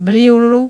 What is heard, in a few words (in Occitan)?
vriolon